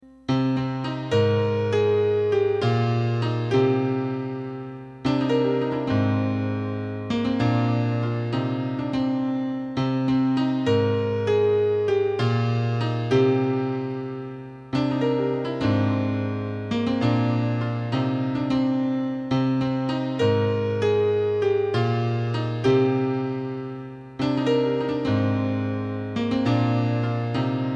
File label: piano